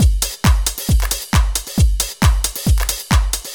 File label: Drums Techno